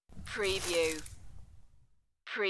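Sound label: Flowers Pick Up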